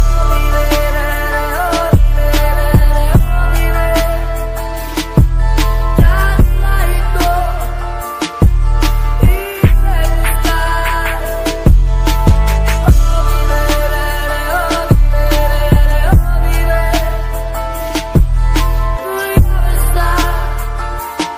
english music ringtone; english ringtones

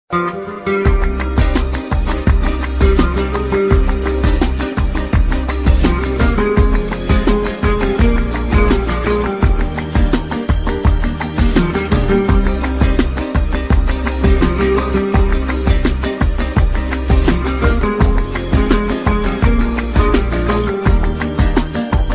arabic ringtone

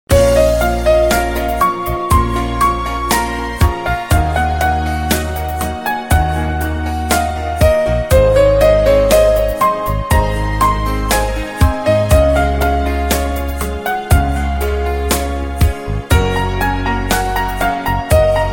romanian piano ringtone

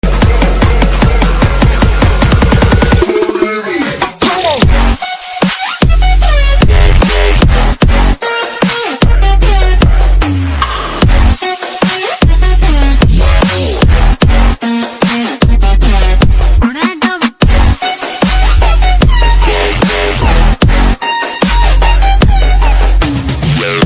dj remix cool ringtone

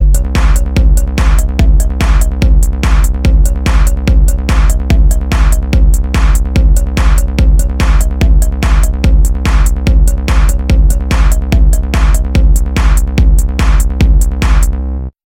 tone ringtone; lenovo

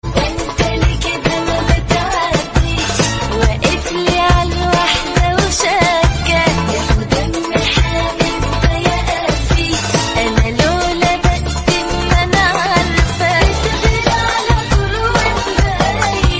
arabic ringtone

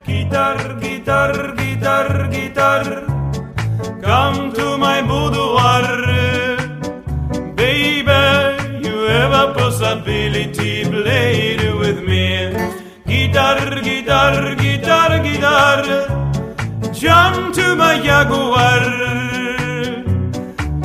guitar guitar ringtone

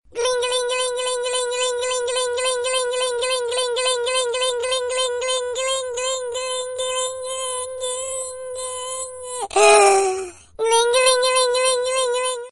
funny gling gling musical tone ringtone

